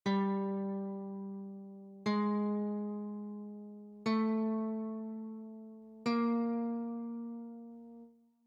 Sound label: G string, guitar; childrens